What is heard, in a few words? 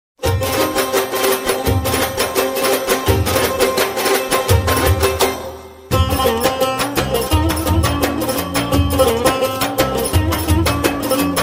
Kurdi